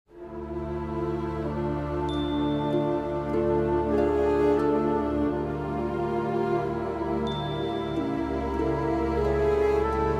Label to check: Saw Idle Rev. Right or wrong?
Wrong